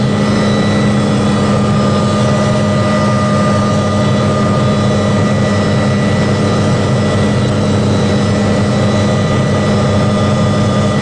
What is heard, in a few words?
Heli Loop Int, Helicopter Vehicle